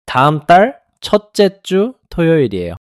neutral long neutral

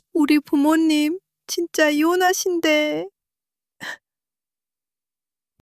crying; monologue

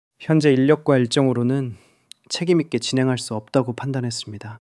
struggle long struggle

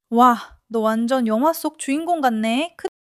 laughter

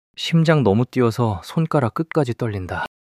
fluster solo fluster

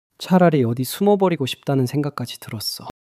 sulk long sulk

